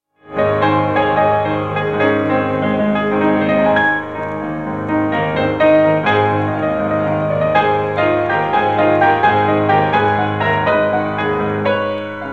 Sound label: barcarolle in f sharp major for piano; op 60